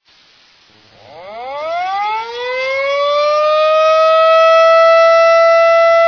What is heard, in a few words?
Airraid Siren